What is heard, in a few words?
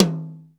HI EDGE DRUM; 90 sSampleCDs; Crypton - DrumBase5000